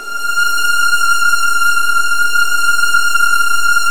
F3, Virtuoso Strings; ILIO; Kirk Hunter Virtuoso Strings VOL-1

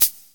Cabasa; Korg Pro-Wave; Drums

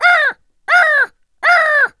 CROW; ANIMALS; AKAI S-Series CD-ROM Sound Library VOL-6

